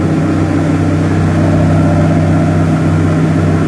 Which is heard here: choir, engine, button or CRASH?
engine